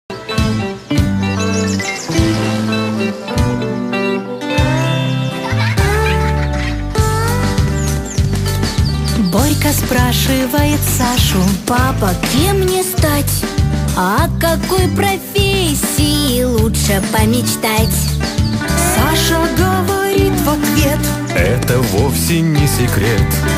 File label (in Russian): Песня про профессии; Профориентация дошкольников